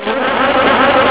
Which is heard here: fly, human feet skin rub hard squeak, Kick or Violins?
fly